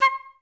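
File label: flutes staccato C5; Best Service; Peter Siedlaczek's Advanced Orchestra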